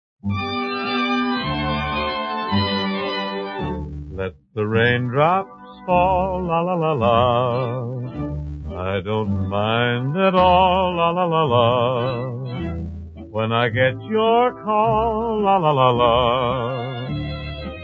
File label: Riaa Excerpt